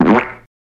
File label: Boink Bork Flatulent